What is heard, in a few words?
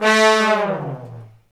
brass fat falls; Roland LCDP06; Fat Section